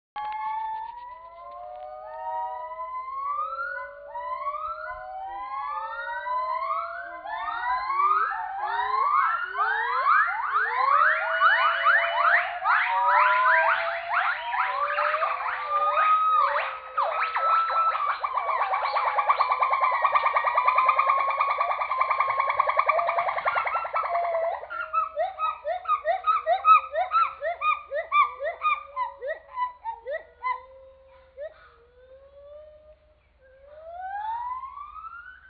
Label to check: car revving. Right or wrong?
Wrong